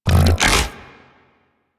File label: Mine Osprey Opening